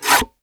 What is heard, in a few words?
CARVE